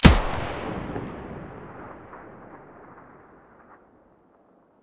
mdr muzzle stereo, weapons

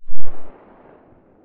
galil silencer mono very far

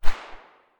silencer p220 mono very far, weapons

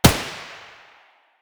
p90 muzzle mono distant, weapons

